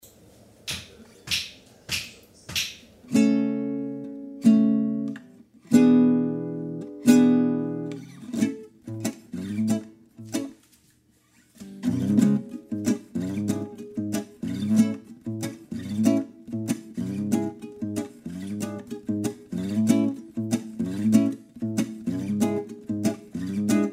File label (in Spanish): Guitarra Ritmica sola con clik